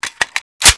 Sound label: m16 mag in metallic